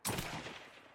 Medium Weapon Shot

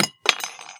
small metal object fall

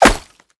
Bullet Impact Tile

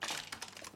Weapon Equip

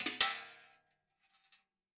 Shell Metal

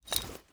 Frag Grenade Unequip

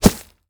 Bullet Impact Grass

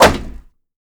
Bullet Impact Metal

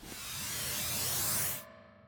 Heal, Magic; Opsive; UltimateCharacterController

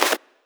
card add

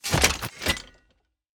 Rocket Launcher Reload; Items; Shooter

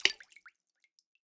Shell Water

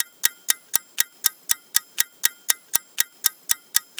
time running out

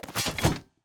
Shield Equip

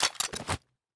Shotgun Unequip

